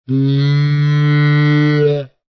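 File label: ANIMAL COW CARTOON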